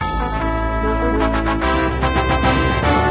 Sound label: victory; asciisec